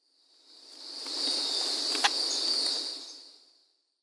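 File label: Sakhalin Grasshopper Warbler; koe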